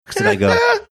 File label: funny laugh